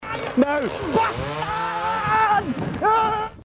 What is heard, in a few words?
chainsaw